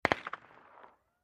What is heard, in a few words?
MP5 9 mm Machine Gun Burst From Distant Point of View, X4